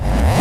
Hard Kick

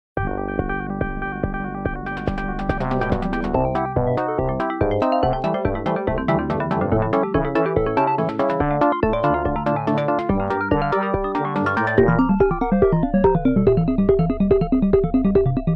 Kids background music; Nokia Ringtones; Audio themes

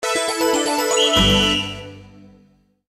Football alarm; Nokia Ringtones; Audio themes

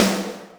snaredrum, Yamaha RM 50

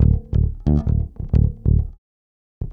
Octaves Groove, Bass Drum Kicks

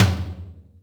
Yamaha RM 50 Toms

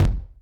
kicks, Bass Drum

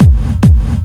Soft, kicks

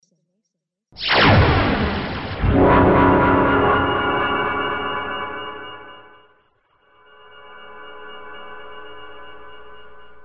Digital Double Build; Sound FX

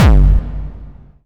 HardCore Kick

